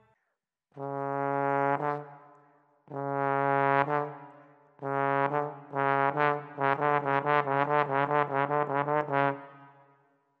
trombone

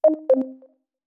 low battery, essential ph-1

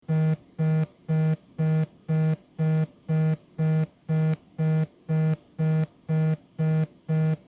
ticktock

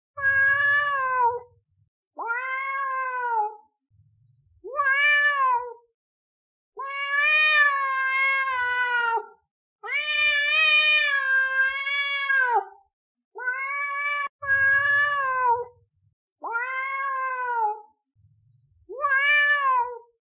cat

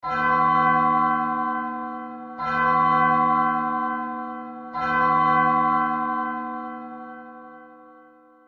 churchbell, undertale

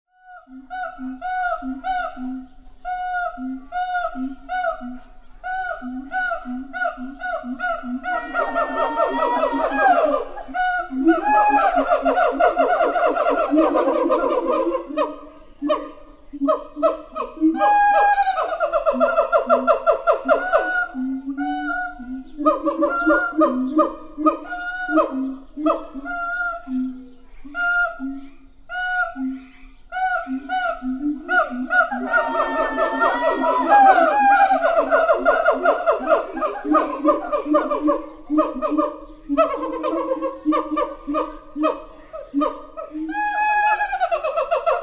gibbon

